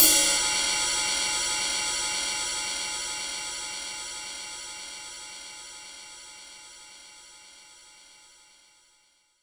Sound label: Ride, Roland JV-2080